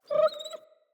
Wolf Cub Trapped Call Close; Animals; Wolf Cubs; Orengal Wolf Cub C

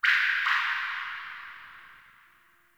Drip Interior Small; Ambience; Warframe; Sentient Lair

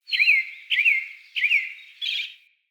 Forest Open Glade Bird Med; Day

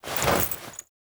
Move Foley Short; Characters; Warframe; The New War; Veso

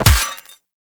Melee Fist Impact Robotic Flesh; Fists

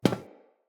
Friendly NPC Step Default; Characters; Footsteps

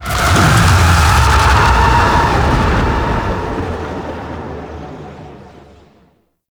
Sentient Vessel Aerial Strike Ascent; Warframe; Tau; Abilities

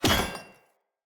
Two Handed Mace Drop B; Weapons; Enemy; Blunt; Sinecure Two Handed Mace

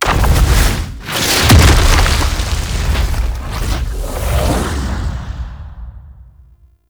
Deployment Cannon Level Start; Warframe; Hub; Tau

